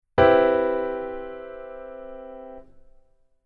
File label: keyboard voicing